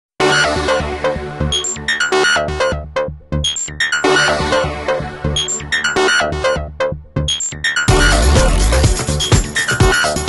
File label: Triangle, Ringtones; Nokia; 7100 Supernova China